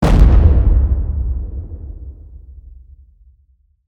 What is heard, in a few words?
ship explosion; weapons; Stellaris